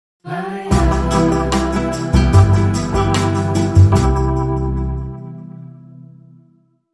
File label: Dreamy Bossa, Hit